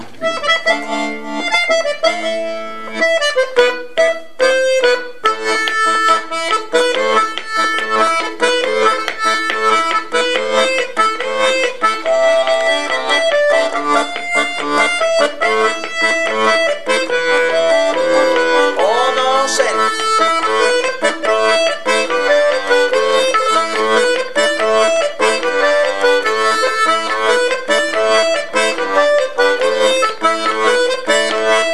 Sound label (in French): Enchainement suite de polkas polka provencale; Bella Ciao